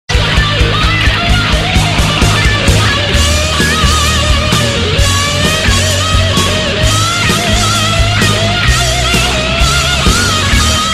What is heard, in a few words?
RINGTONE, ACOUSTIC GUITAR